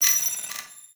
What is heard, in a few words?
Side Pillar Rand, Small Cargo Room